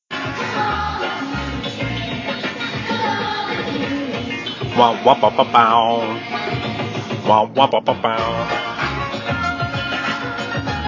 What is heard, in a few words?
huh puh puh puh pow